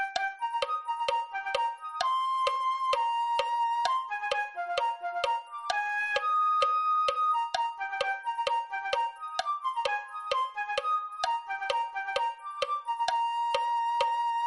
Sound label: flute 1